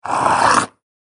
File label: Dragon Beast, monster; horror